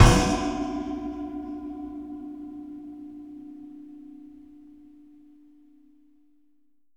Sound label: RACK STRIKE, PERCMETALIC